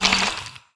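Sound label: skeleton yell; monster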